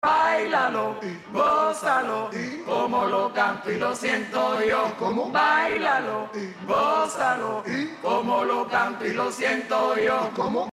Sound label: Ovox Sing